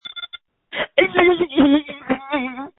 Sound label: screams